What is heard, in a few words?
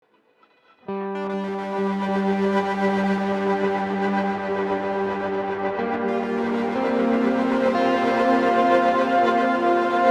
Distorted delay